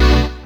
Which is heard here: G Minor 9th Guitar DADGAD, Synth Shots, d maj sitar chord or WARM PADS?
Synth Shots